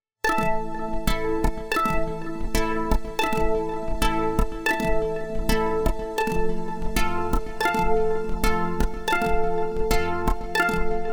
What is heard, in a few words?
Accelerator Resonator